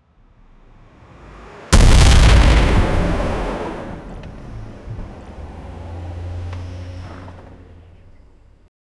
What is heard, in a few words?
big car truck crash accid